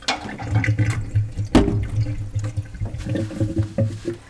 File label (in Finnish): tiskiallas